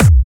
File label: Trance Kicks